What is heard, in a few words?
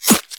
SOUND EFFECT, Metal; AKAI S6000 CD-ROM - Volume 1